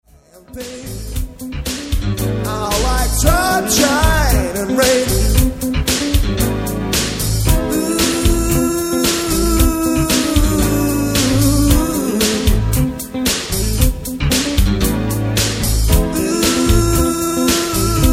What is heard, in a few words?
joy pain